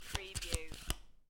Playing Cards Flip Three Cards Off Top Of Deck; Casino / Poker, Cards & Dice

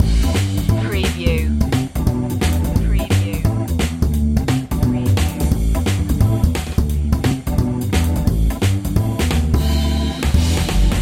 swing loop

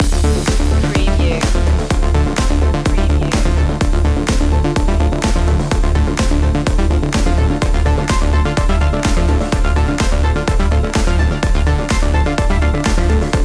body building loop

